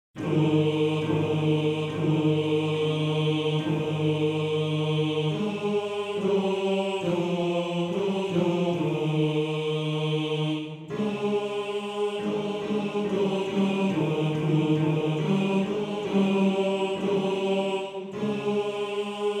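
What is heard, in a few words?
Mala Moja C Bass 1, Männerchor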